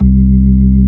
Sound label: B3, Drawbars